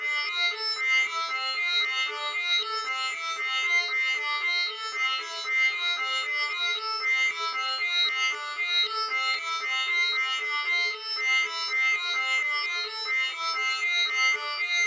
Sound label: Violin Sound